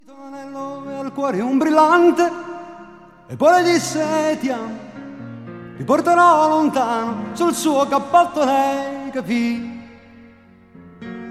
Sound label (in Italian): voce e piano